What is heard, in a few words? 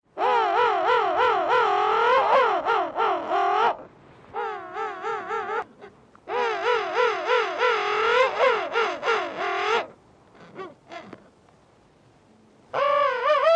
king penguin